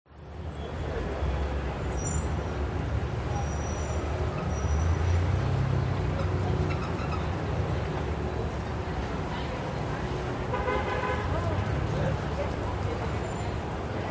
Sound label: New York City Traffic; audio hero